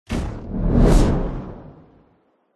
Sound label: cinematic whoosh impact airy; sound design